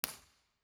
IR Siracusa

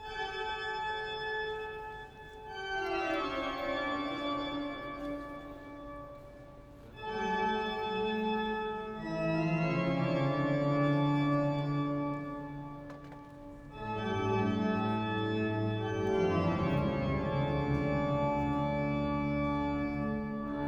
Orgshort, Organ; Organ BG

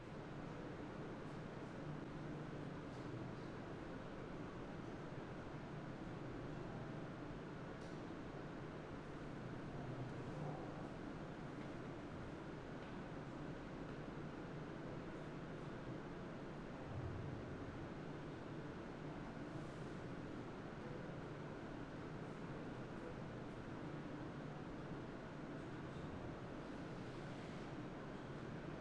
Signal BackNoise

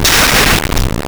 Scala, Impulse Responses, Left Up; Ambiovolver

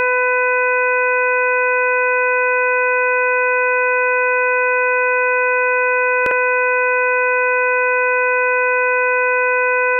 Multitone

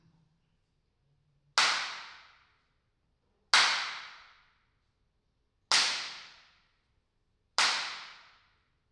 Clappatore BIN

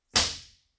impulse response; Corso acustica

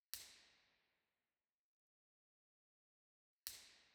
Musikverein, Theatres

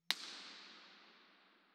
IR sweep BIN; Bergamo; Frazier; IMP-RESP

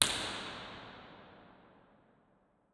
IR; PalazzoCusani; testa 2.5m

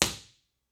IR

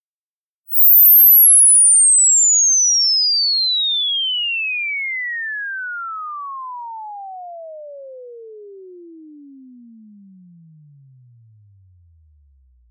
Invsweep 22 22k

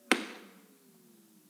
IR sweep

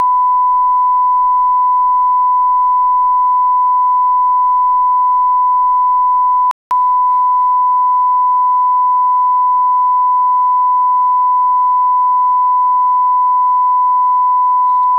Calibration 94dB 1000Hz

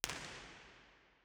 First IR Sala 2800 Genelec Center; Santa Cecilia Roma; Measurements 2005; Soundfield

